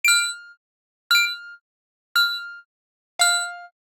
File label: Digital Click Positive